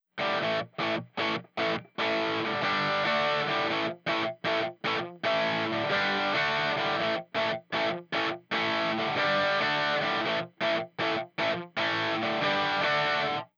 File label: distortion